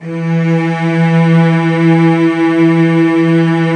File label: E2 low, lush strings; InVision Interactive; Lightware VOL-1; Instruments & Percussions